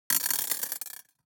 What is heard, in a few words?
DICE on Glass, Throw and Roll, Mini, 6 Six Dice; Samples; ArticulatedSounds; DICE Sfx Party (Extended)